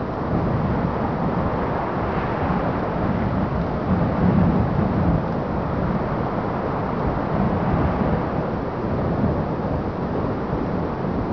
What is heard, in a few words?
wind outside loop; school; biolab